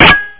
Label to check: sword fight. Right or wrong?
Right